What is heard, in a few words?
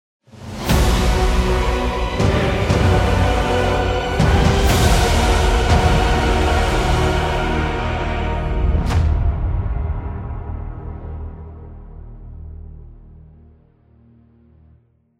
lose; valve 2021; stingers